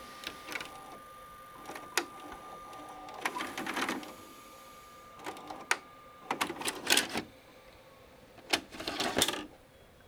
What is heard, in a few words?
VCR Tape Eject; SFX; IRL Recorded